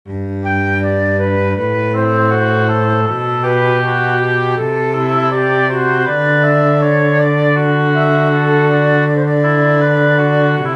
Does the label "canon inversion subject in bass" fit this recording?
Yes